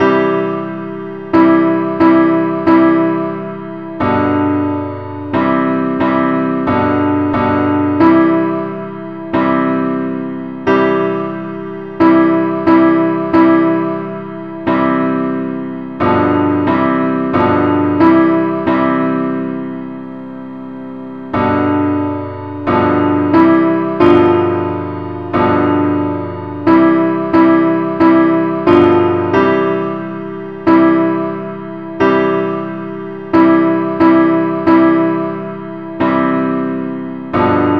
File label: simple acc key c